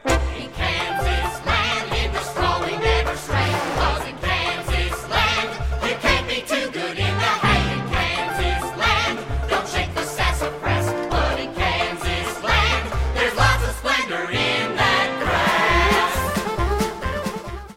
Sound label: Ensemble